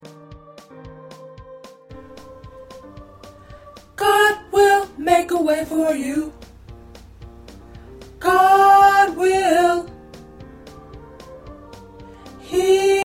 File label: Tenor